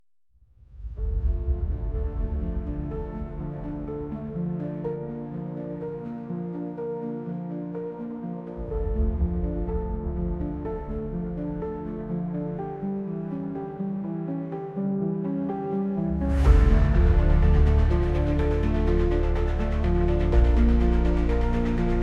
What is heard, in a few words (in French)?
Ambiance Web